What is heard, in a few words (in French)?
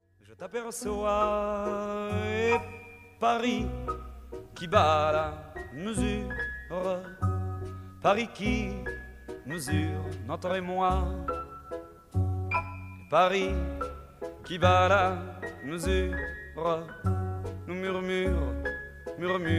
valse